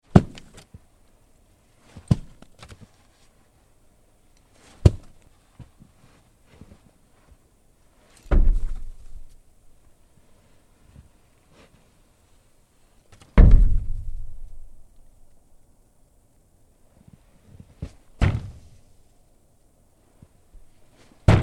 Snowball Impacts Various on Wood Snow Face Glass etc; onto face, body, glass, wood and ground; Miscellaneous Impact